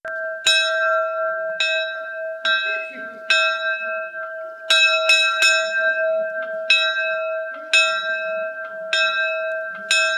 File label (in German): glocke; lippoldsberg